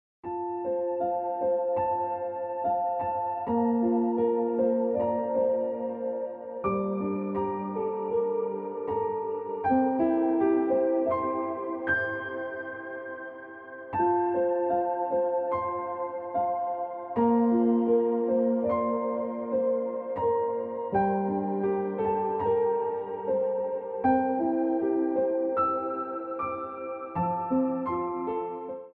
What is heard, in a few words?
Ringtone Piano Music